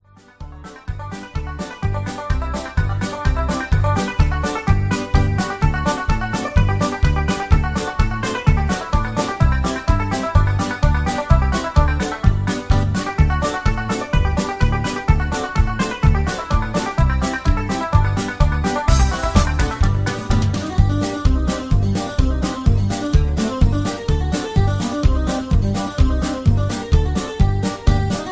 Hi Hat Hornpipe Patter

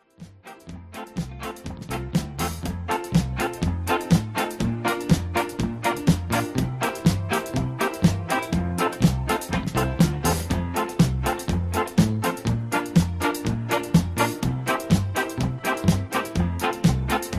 Patter

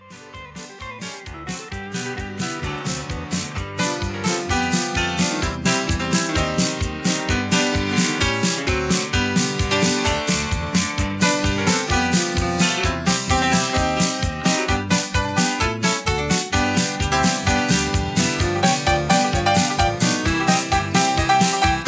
Patter

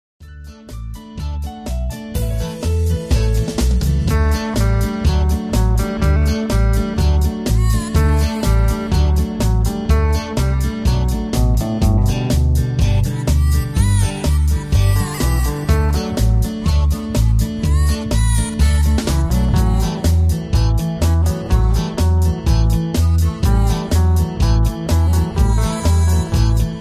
instrumental

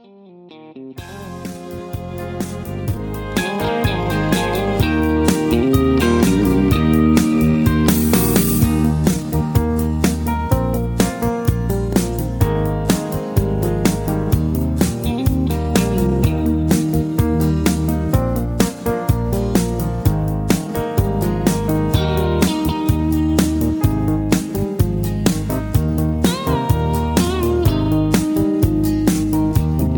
Country High Key

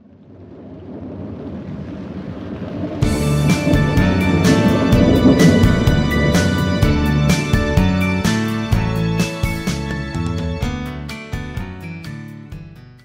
Instrumental Two Step

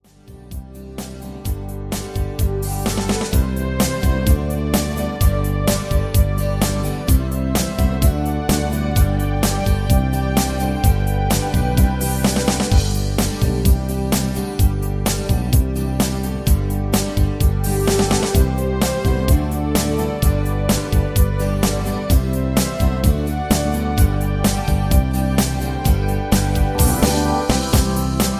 Instrumental No Leads; Singing Calls

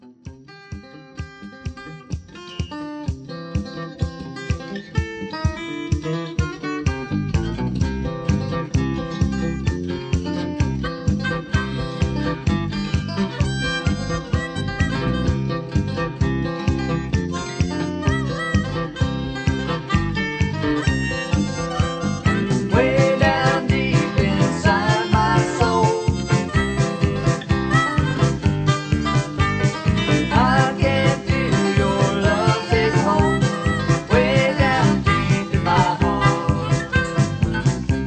Instrumental

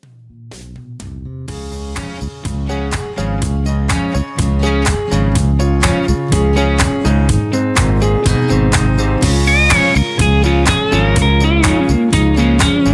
Patter

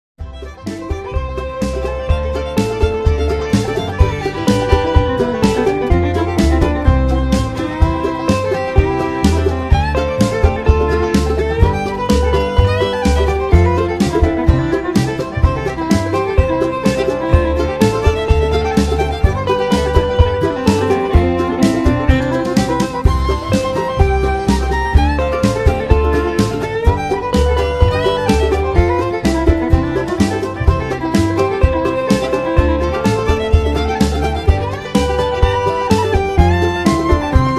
Key of C

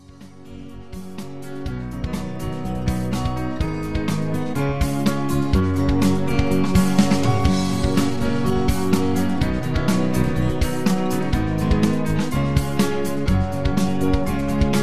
Instrumental Lower

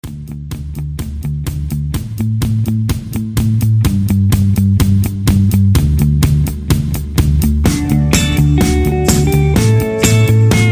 Instrumental with Leads

